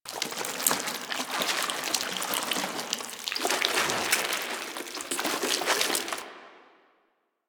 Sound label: Rupture Loop; Warframe; Corpus; Arachnoid Hunger; Arachnoid Kahl